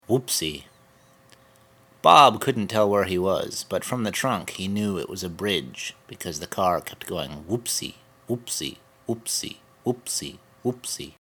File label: Whoopsy